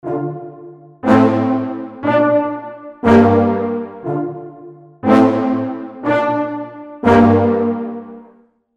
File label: Brass Short